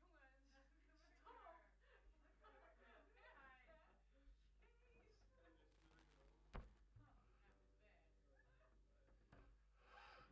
Bass DI

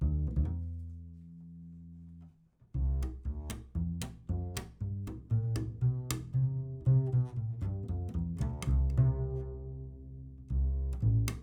Bass Mic

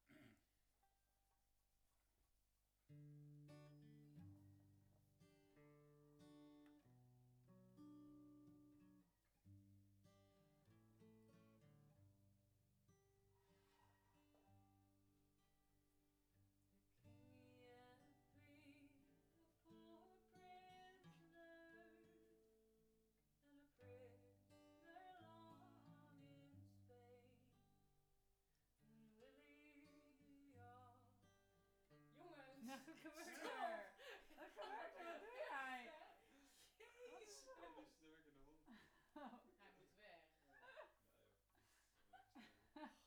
Banjo

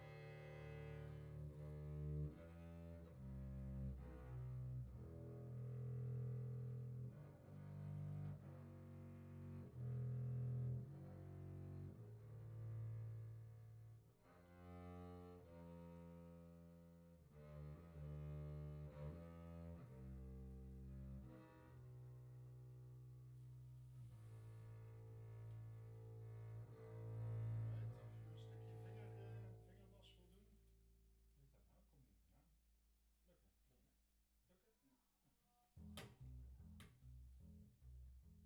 Banjo

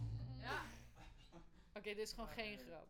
Voc Fig8

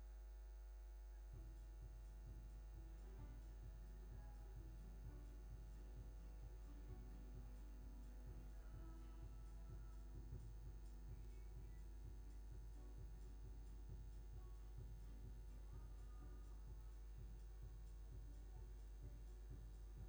Bass DI

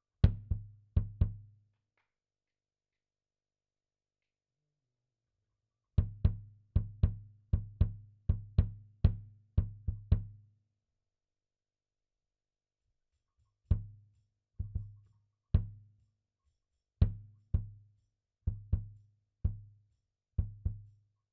Kick

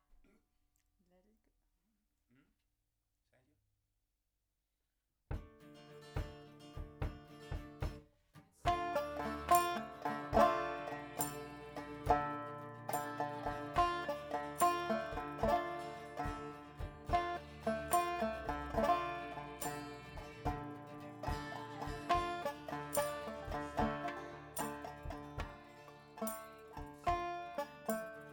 Banjo

